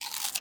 熊猫进食声

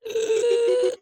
炽足兽撤退声

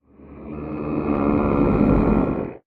监守者焦躁声

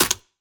红树根踩踏声

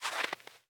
细雪踩踏声

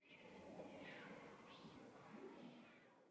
灵魂沙峡谷低语声; ambient

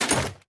装备下界合金盔甲声

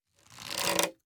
弩装填中段声

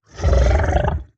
僵尸疣猪兽待机声

